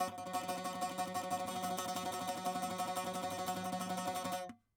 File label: saz